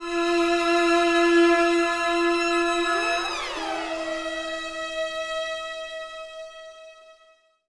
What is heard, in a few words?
Orchestral Loops STRING ACCENT; Best Service ProSamples vol.33; AKAI